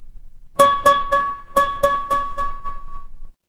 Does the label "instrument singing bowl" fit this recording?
Yes